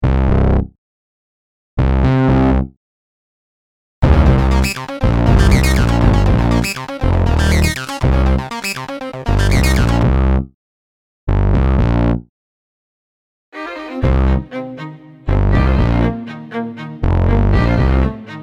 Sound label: theme song